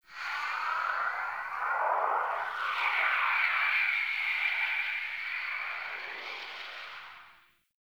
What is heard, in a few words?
Venus Atmosphere Gust, Ambience; Warframe; Venus Open World; Venus Landscape